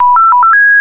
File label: Pickup, Signalling Tones Elevator Phone